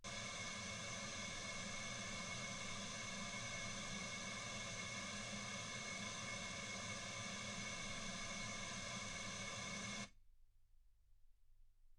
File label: wgn